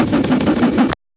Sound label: BASS SOUND; Foley; Crowdsourced Sample Pack; TRUTH-HZ